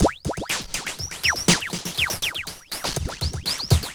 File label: Drum Fx Loop, Electronica